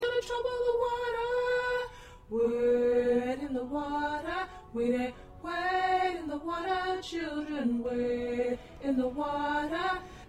Tenor

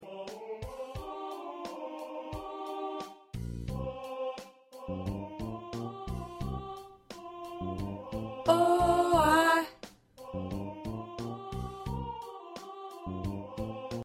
2nd Tenor

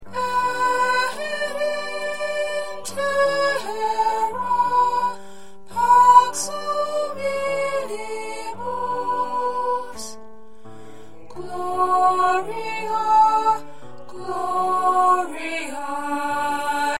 Gloria Hodie 1st Soprano

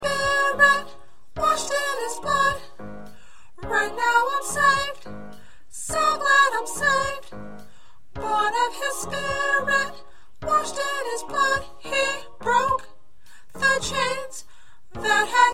Soprano

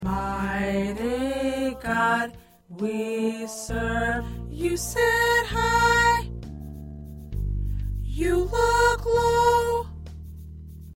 Soprano

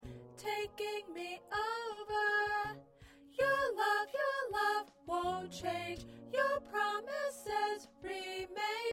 Soprano

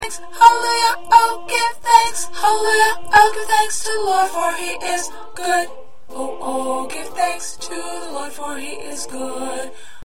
1st Alto, choir parts

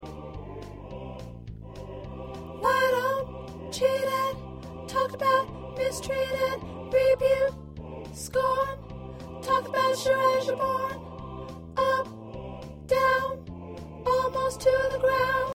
Choir Soprano; Long As I Got King Jesus

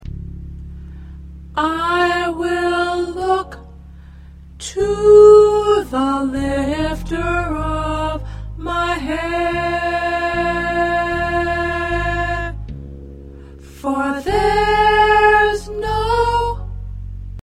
P Soprano

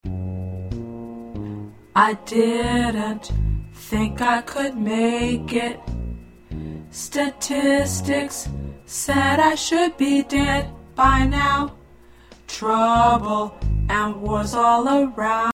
Alto

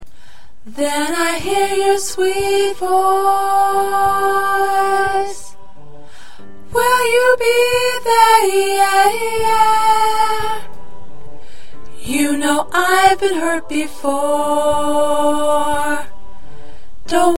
2nd Alto

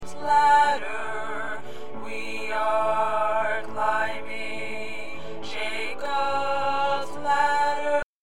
Tenor, choir

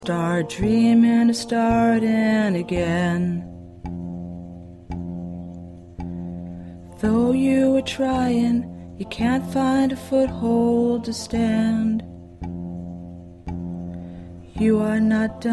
Voice 2

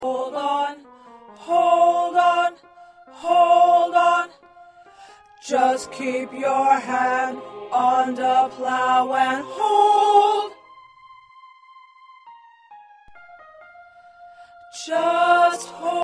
1st Tenor

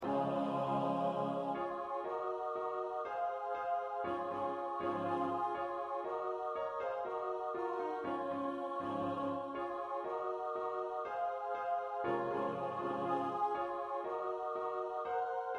Lead

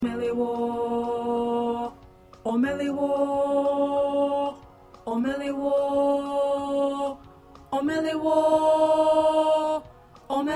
Tenor 1

